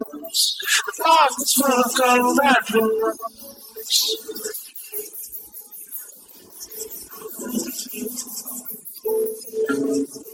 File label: vocal